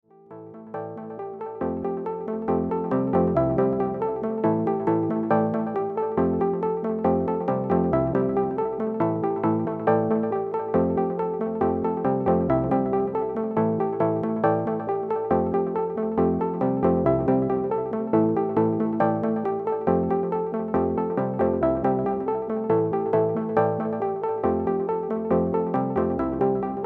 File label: parp chord delay